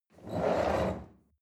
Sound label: chair wheel roll on floor medium squeak